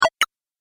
Game Pause, video game

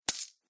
Gold Coins Drop; Video Game; Video Game Sound Effects

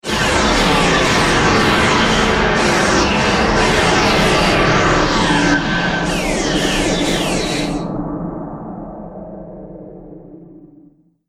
Ufo Mothership Destroyed, Spacecraft

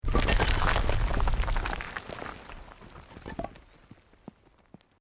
ROCK DIRT SLIDE SMALL

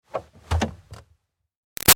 Car Highlander Parking Brake Release; 2005 Toyota Highlander V6; Cars; Vehicles; Big Room Sound

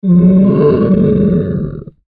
Monster Groan Moan Wounded Beast Creature; Horror; Animal; Monster Sound Effects

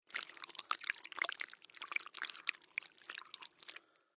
Horror Squish Long Juicy; Monster Sound Effects; Monsters (Sound Effects:Horror); SFX Bible 6

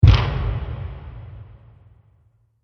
Monster Footstep; Monster Sound Effects; horror

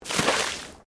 Water Splash Concrete Medium; Elemental weather effects; Nature

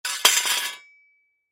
Sword Drop Single On Ground Cement Cutlass Pirate; Warfare; Blastwave

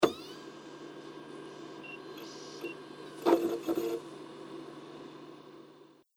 Dvd Blu Ray Machine Short Data Read; entertainment system; Entertainment System Sound Effects

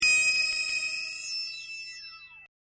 Hip Hop Synth Licks 90 Bpm E; Head-Sound; Royalty Free Synth Loops; LynneMusic

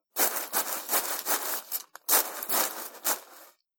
Packaging Paper Noises; Foley; Andrew Fleming

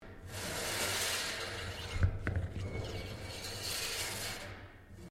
METAL TAPE MOVE; Sound Effects:Materials; Foundation3

